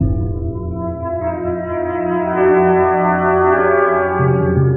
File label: strings, Sad Loops